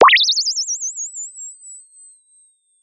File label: Sound of a bird tweeting nicely